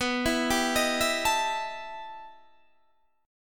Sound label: E Minor Major 11th B, guitar